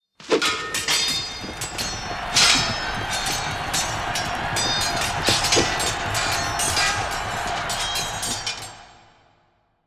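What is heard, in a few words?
Cheering Loop; Kansei; Yu-Gi-Oh! Master Duel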